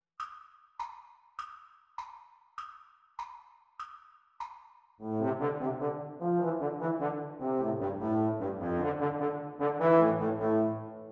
Playalong for Trombone, Speed; Exam Speed

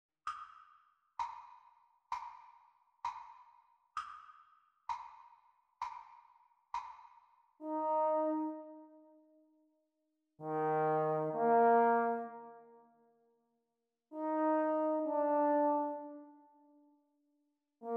Playalong for Eb Horn Practice Speed

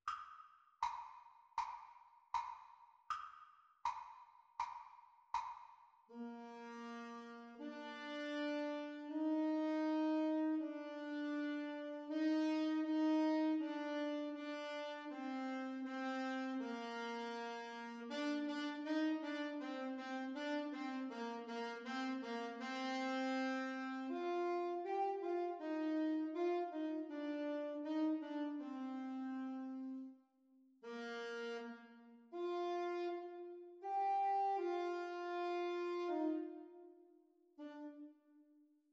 Sax Duets Playalong; Exam Speed; Alto Saxophone duets

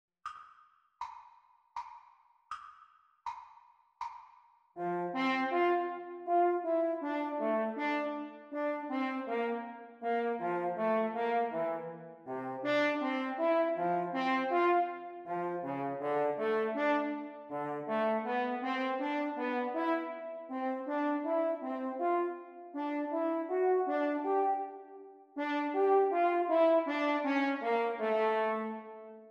French Horn Duets Playalong

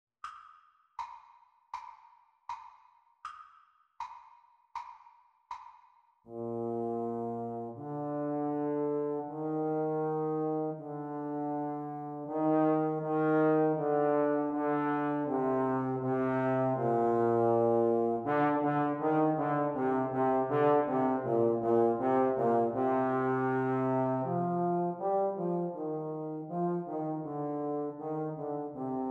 Playalong for Trombone, Speed; Exam

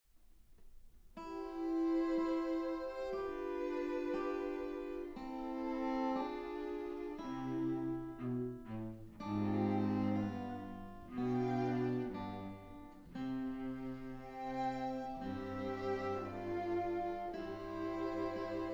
with guitar

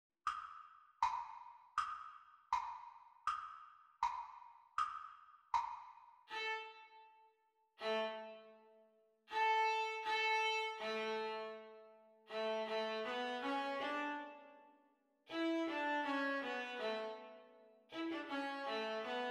Viola Duet Playalong Practice Speed

